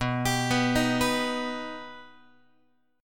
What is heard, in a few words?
B Augmented B down Guitar; arpeggiated; JGuitar